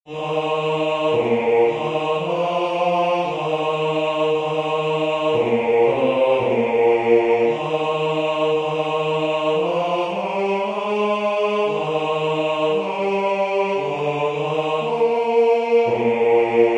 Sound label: Bass; SaintSaens; Ave verum